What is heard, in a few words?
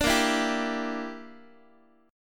A sharp 6th Flat 5th A sharp down Guitar 4A,4E,5A,5D,5G sharp,6C sharp, chordshape; A#6b5; strummed